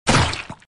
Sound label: Enemy Dragon Dead